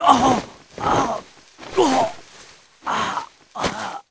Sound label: die; Seer; GamigoNA